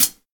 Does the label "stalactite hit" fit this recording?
No